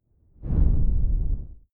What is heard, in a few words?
whoosh simple low short grainy